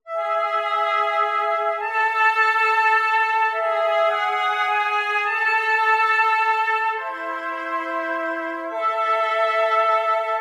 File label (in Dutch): vierstemmige zetting; bedekte parallellen